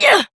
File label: Jump Adult Operator; Warframe; New War